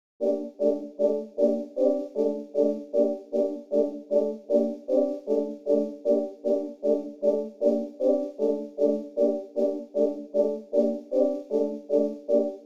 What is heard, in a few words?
154 BPM G Min Soft Chords, melody loop stem; Trap; Loop Stems; Cymatics; Bullet; 2022 Melody Collection